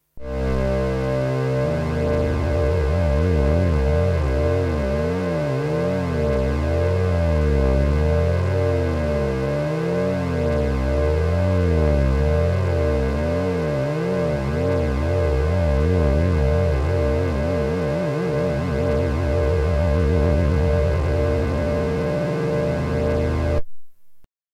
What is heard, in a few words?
lfo pitch; arduino